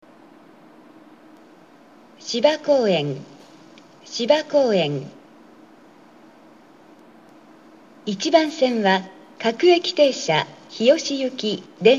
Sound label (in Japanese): shiba-koen 1 bansen, subway